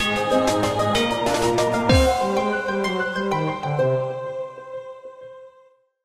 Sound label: final fanfare; pypvz